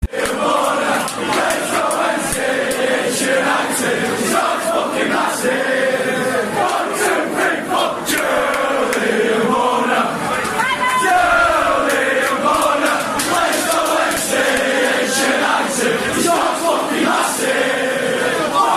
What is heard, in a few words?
Chant